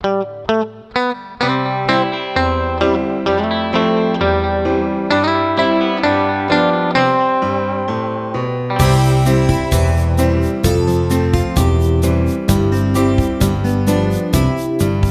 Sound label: Harmonica to play along, blues harmonica; Old MacDonald had a farm